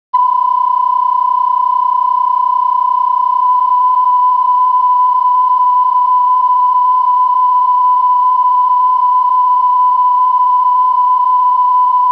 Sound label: Psk31 Qpsk